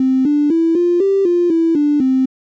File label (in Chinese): 音阶1-5